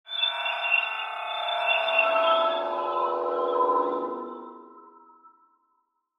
Sci Fi Feedback Swells; Blastwave

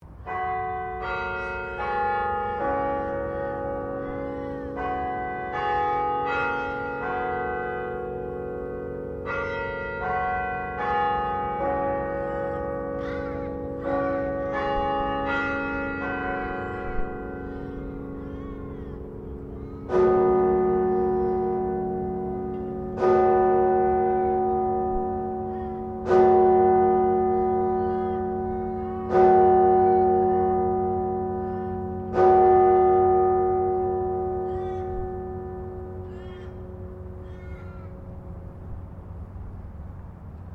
Carillon Canberra 5Pm Chime Close; Carillon in Canberra; Bells; Musical

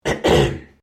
MALE CLEAR THROAT; Clearing Throat; Human

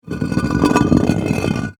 Rock Movement; BlastWave

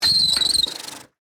Bicycle Brake, Squeal, Schwinn, Vintage; Sports; Bicycles; BlastWave

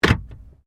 Car Toyota Corolla Glovebox Close; 2009 Toyota Corolla hatchback; Cars; Vehicles

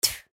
Human Spit; Spit Male Twelve Yrs Boy; Human Spit Male Twelve Year Old Boy; Spitting; Blastwave

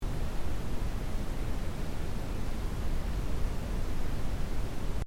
NOISE BROWN; Reference tones that can be used to test hearing and callorbrate equipment; Sound Design

